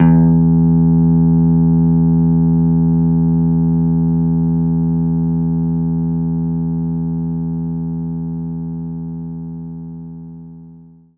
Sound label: Jazz Guitar E2; knights try